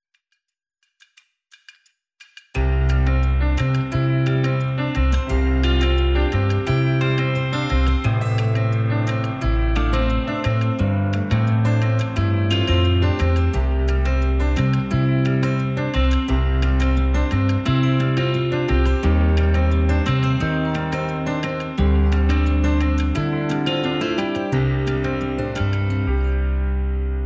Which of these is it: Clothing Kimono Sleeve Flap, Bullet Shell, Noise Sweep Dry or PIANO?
PIANO